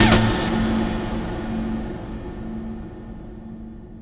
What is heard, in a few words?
Massive Hit